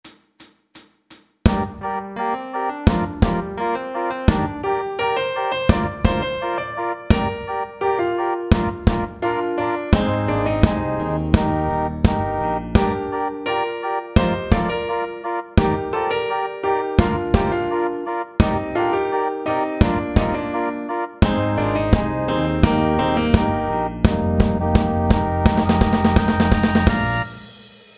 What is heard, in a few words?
MS Chorus accompaniment; Middle Schools